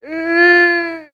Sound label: crying child